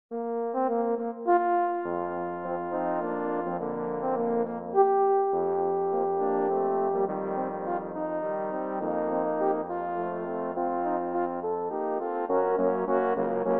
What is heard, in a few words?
Horns